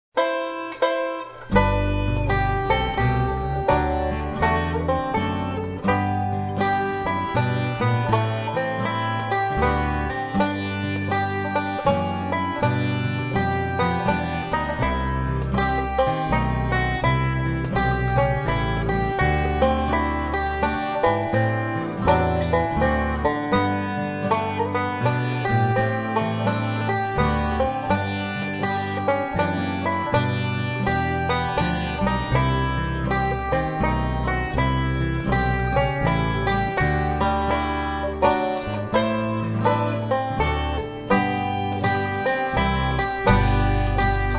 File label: old rugged cross, banjo